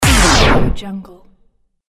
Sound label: vinyl record stop